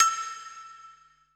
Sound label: HIBLOCK, PERC; BPC PERC